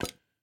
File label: button Click; imperialriches